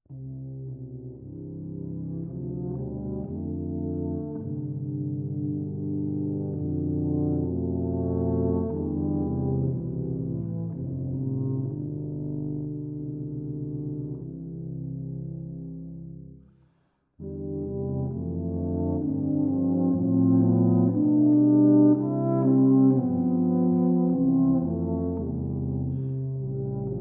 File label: Tuba Trio